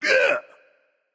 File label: grunt hit; smite; sobek